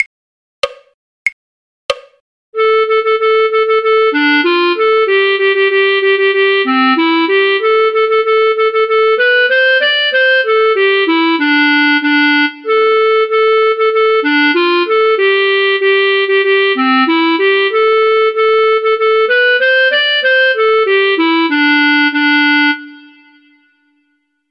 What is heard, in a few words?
clarinet; drunken sailor; folk